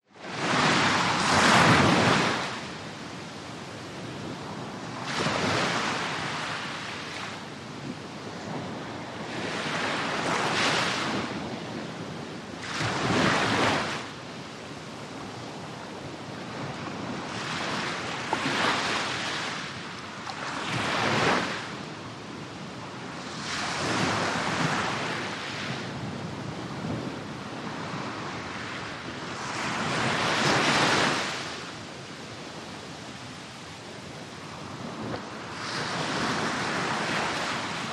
Lht Surf Close Up, waves & surf

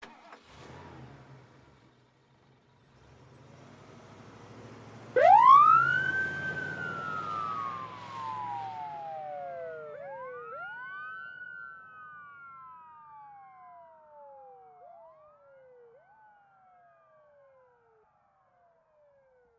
Ambulance Start

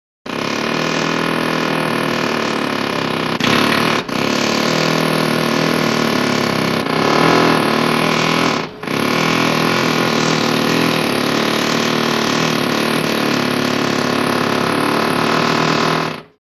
Pneumatic Chisel; chisels

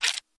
Motor, Small; Electric Toothbrush, Quick Spurt

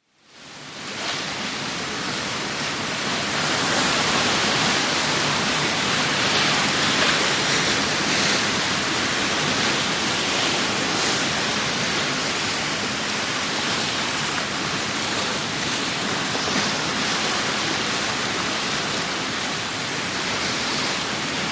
Ocean Liner Bow Wash S, ships

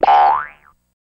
Boing Boink Generic

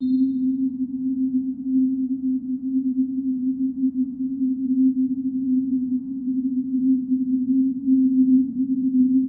scanning ray, drones tones

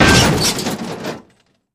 Metal Impact Crunch

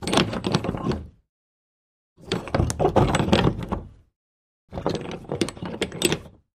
Padlock Metal Hooks

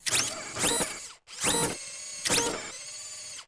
Boxing Robot Fall, servos

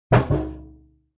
Garbage Can Metal Buc; barrels buckets & cans

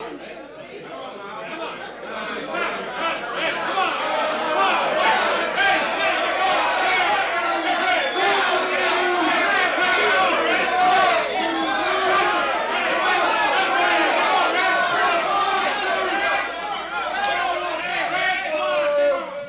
Crowd Angry Build Up

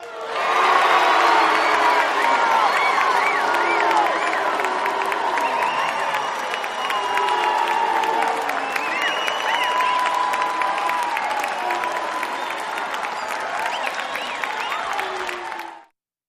Crowd Reacts to Statement

